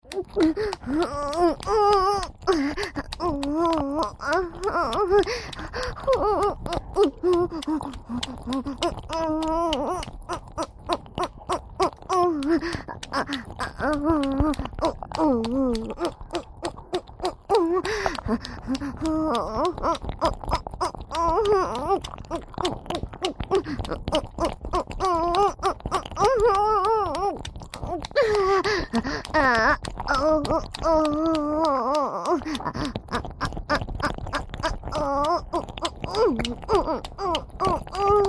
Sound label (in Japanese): オホ喘ぎ れろれろ両耳舐め; 3WAYループ; オナサポ